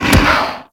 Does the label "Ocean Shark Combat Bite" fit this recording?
Yes